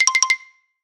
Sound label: pikopiko